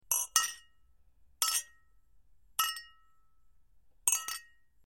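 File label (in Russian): звуки чоканья на празднике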